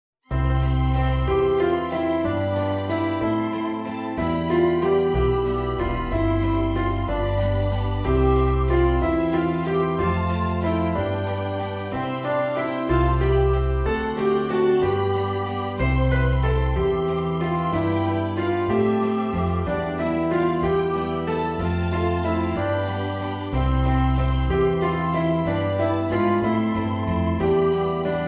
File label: tutti in C; Sol og sommer ved Sandersvig